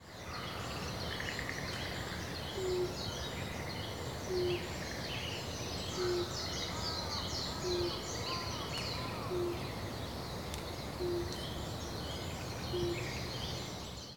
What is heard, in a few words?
Japanese Night Heron